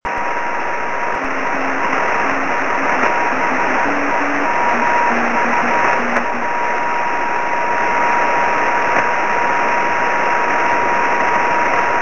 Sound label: beacon 23cm; sm7lcb; gb3edn